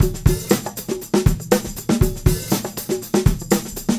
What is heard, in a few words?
Combo, R&B Drums; Sonic Foundry (Sony Creative Software) - R&B Drums